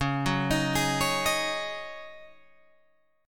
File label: Db Suspended 2nd Suspended 4th Db Guitar Open G